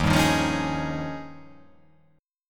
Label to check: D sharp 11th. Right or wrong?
Right